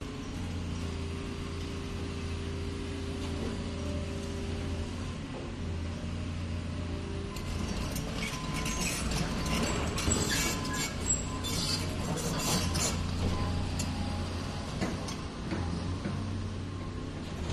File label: excavators contruction site idles and drives; your free sounds